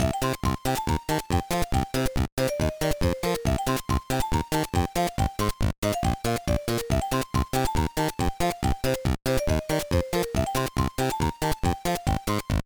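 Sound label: background music; No No No Puzzle Chailien; GBA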